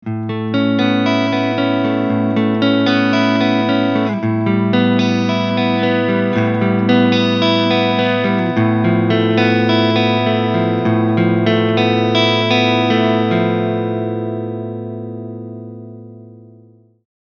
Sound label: NECK, krank; eclipse active